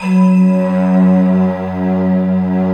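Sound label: F#2, GLOCKENSPIEL FLUTE; Best Service; Advanced Media Trax Modern Composer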